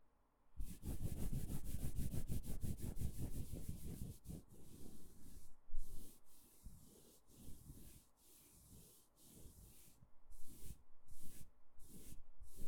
マッサージ, ASMR; 耳が気持ちいいASMR【マッサージ/耳かき/タッピング…】

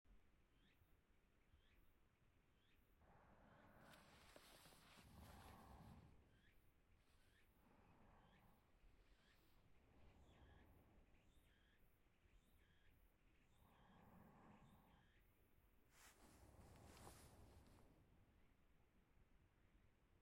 寝息を聞きながらだらだら添い寝

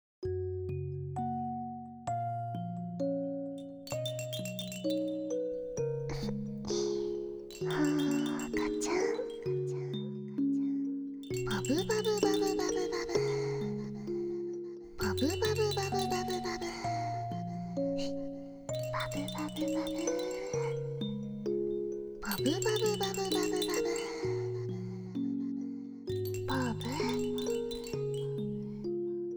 ふわふわメリーと優しいガラガラ、ママのお声で、おやすみなさい; ママの寝かしつけ; ママ学級

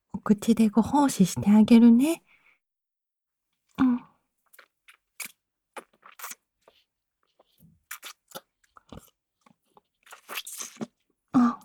フェラチオしてもらう音声; 綾音; 現役アイドルは僕だけの彼女〜エッチなアイドルとあまとろえっちする日々〜

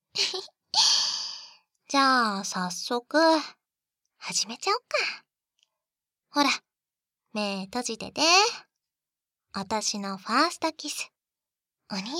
キス＆ディープキス, ASMR; Whisper♪Feel; JK

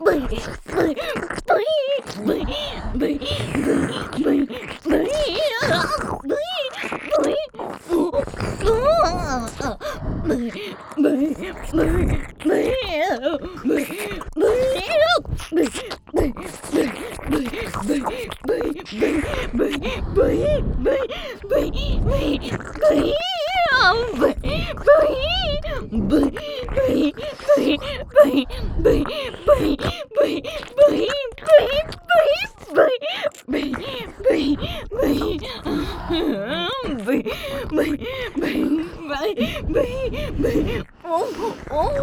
ブヒ声喘ぎ＋耳舐め; エルフの女王、メス豚堕ち

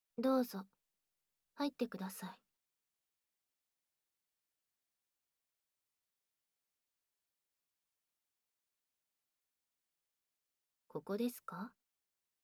お耳ふーふー&耳舐め囁き手コキ; SEなし

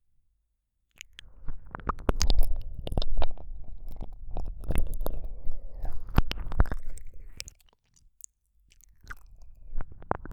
夜のしっとり耳舐め, ASMR; 【超耳舐め特化】夜に癒されるたっぷり耳舐め【安眠ASMR】

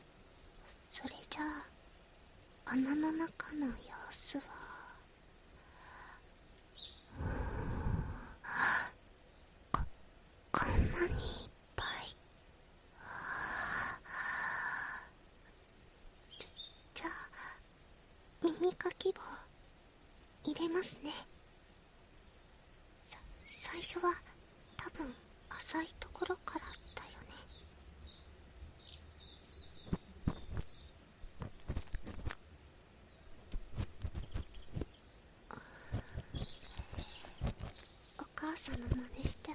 【左】浅め耳かき, ASMR; エルフメスガキ; 森の癒し耳ほじり

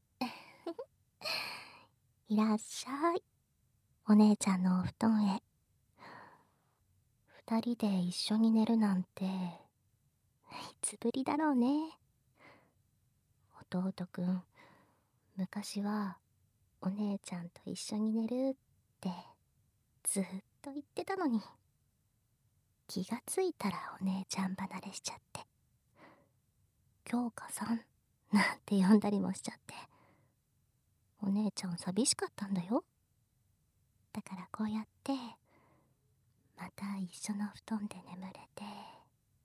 睡眠導入添い寝耳舐め手コキでぐっすり, ASMR

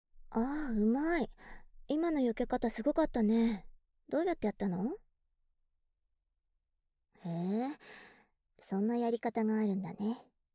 耳元で密着オナニーしながら耳舐め, ASMR; このままゲームできる？; SEなし

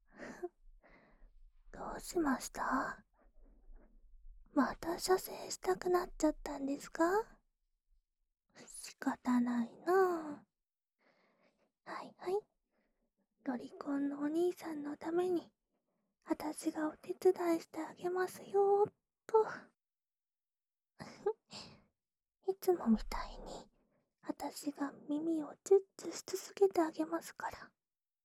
オナサポ用耳舐めループ（右耳）, ASMR; 特典 耳かきリフレ『春乃撫子』へようこそ♪～噂の新人キャストは、天才メスガキ! 悶えまくりの耳イキプレイ♪

